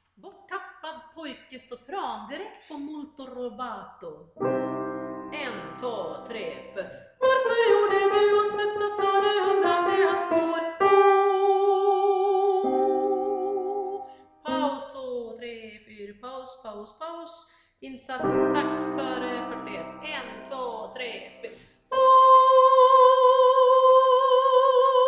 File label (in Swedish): sopran; Borttappad pojke